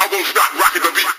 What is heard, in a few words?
Vocal Phrases; Vengeance Samples; Vocals